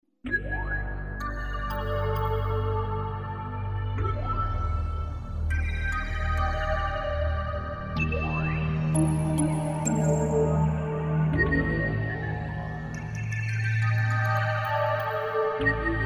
pad mient, Yamaha TG-500

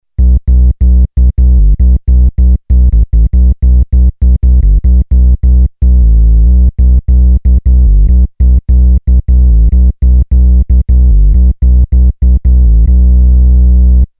sub, Novation Bass Station II; Sub blow speaker; analog monophonic machine with subtractive synthesis

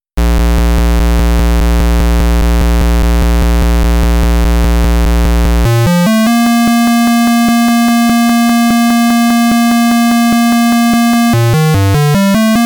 xmas bell, Triadex muse

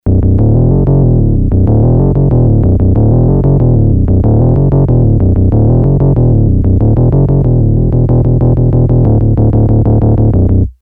yamaha tx81z

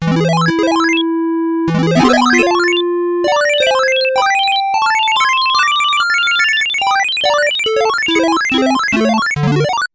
synth pacman, yamaha tx81z

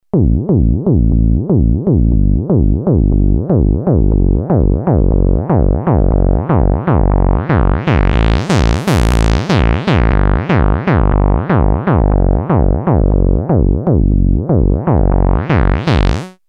bass power, Moog minitaur; analog monophonic synthesizer

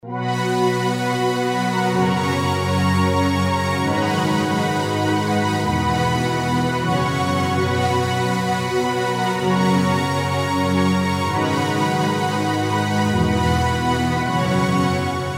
pad bright, Pad: bright, Roland JV2080

